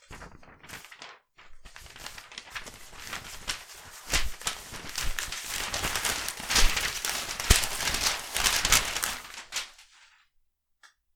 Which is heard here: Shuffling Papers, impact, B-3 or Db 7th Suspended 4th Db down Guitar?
Shuffling Papers